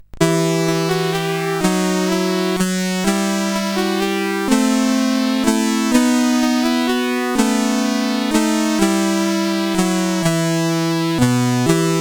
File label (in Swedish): Svensk Melodi, CSG8580R5